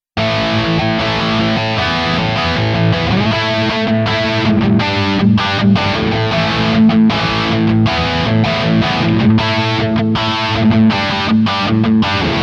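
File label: Bridge HB Highgain Rhythm, guitar; Schecter Nick Johnston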